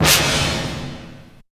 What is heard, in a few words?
Strange FX; Zero-G Jungle Warfare 1, 2 & 3; 90 s Sample CDs